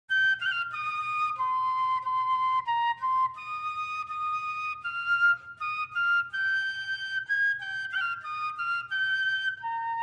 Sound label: Parting Glass (Fife); 42nd RHR